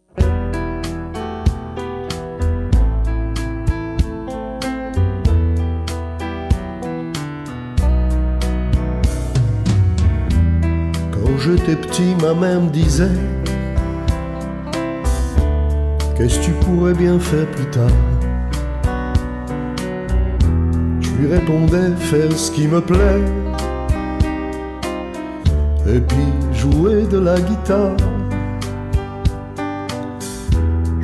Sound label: Guitar; Guitar hero